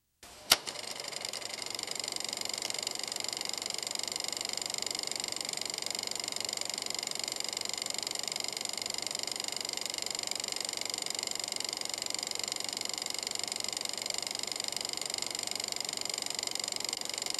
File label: a sound of a starting, vintage